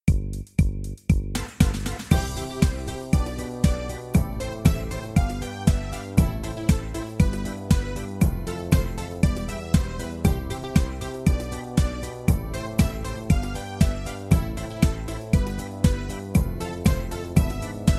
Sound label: cheerful people